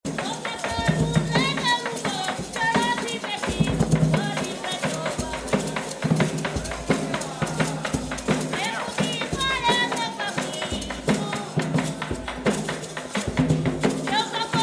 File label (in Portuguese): batuque de umbigada tiete com raiva